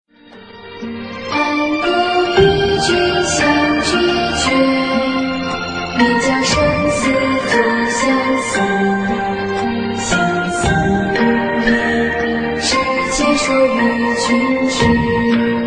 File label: Childrens Music